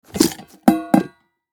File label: Pedal bin close lid; household